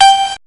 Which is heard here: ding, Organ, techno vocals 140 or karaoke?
ding